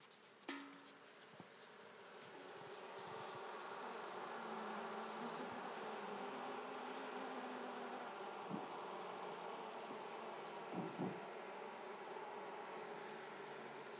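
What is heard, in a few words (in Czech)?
vcela, bee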